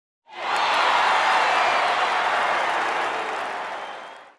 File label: crowd Cheer; race